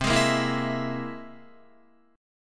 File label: C Augmented 7th, Guitar; strummed; JGuitar